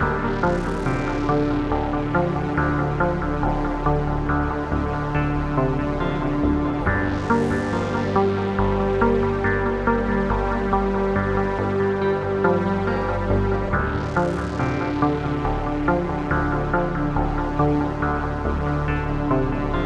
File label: neon grunge; looping